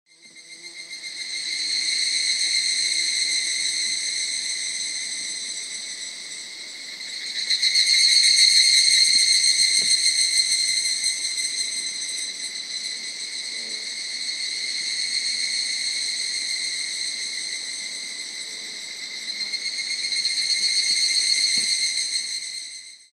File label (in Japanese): higurashi